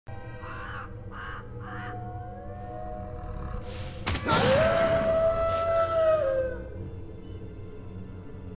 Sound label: audio slot